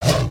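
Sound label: Sabertooth Approval; creatures; pets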